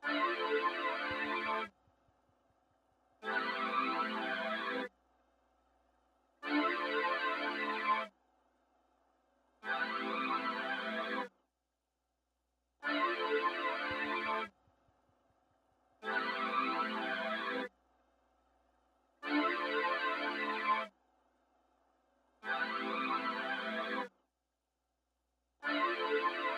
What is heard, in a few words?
150 BPM A Min Pad; Loop Stems; Cymatics; Mixed Feelings